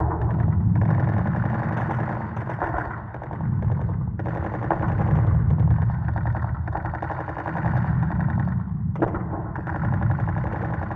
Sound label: warfare amb guns high